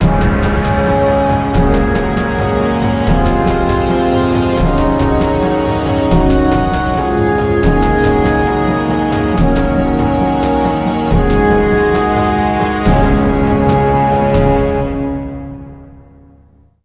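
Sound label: music orchestra cue; mcdemo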